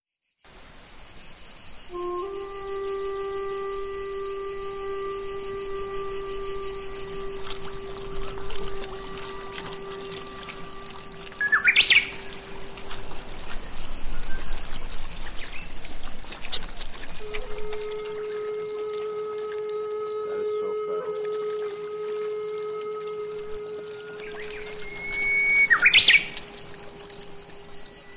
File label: Zen Garden Improv